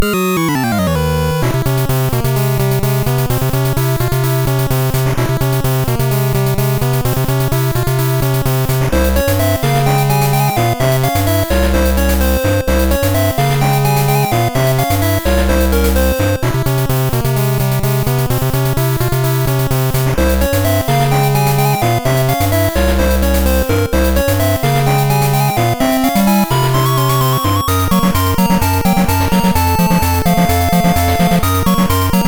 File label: Monster Battle; bomberman quest; gb